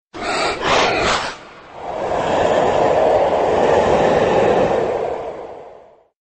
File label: retro horror sound